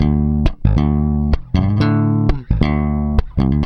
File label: Groove Pluck, Bass; Construction Kit; Riffs, Patterns Licks; Bass X